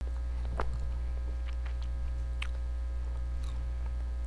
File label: door